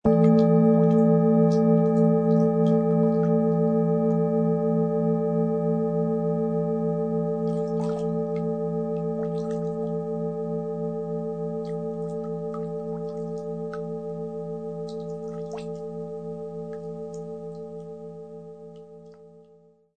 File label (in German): klangschale